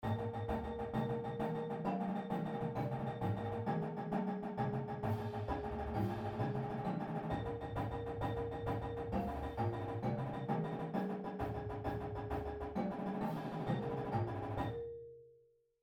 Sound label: Accents Drags Fives, Drumline Exercise; pdlmusic; Drumline Ensemble Series